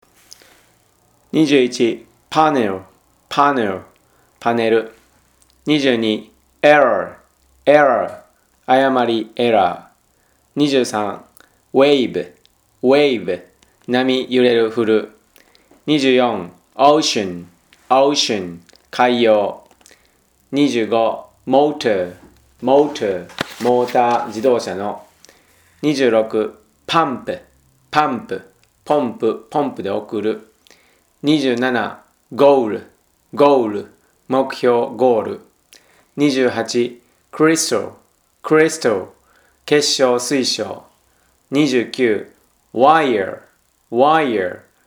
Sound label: tango